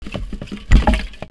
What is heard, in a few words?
empty recycle bin; windows